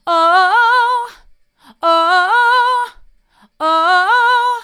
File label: oh oh oh hard, vocal